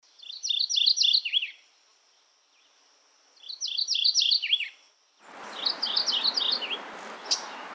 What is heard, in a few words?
WARBLER MOURNING, birds; species/birds; wbba